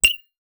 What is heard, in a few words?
short beep on ui menu; cybernetic